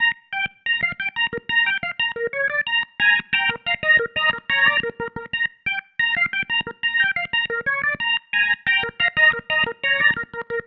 Pattern, Organ

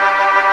Organ, MortStrg+WurlVox

